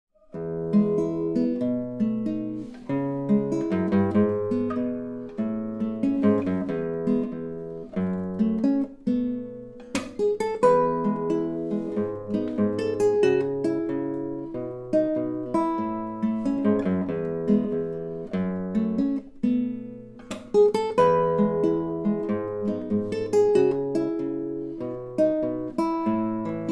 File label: ragtime easy guitar